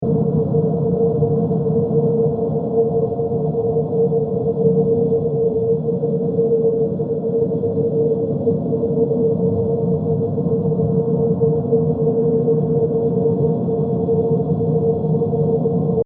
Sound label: Deep Space Ambience, 120bpm; Sound Design